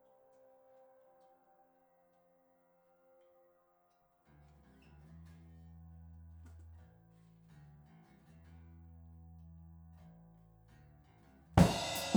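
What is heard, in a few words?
Rode overhead Drums, stereo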